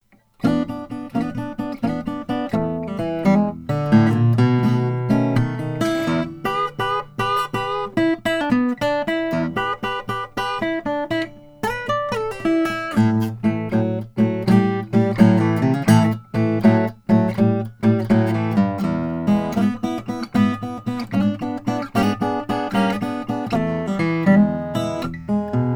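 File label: osthoff grand parlor wenge, guitars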